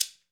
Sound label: GHANA, African Perc; E-MU Formula 4000 Series Vol. 4; Earth Tones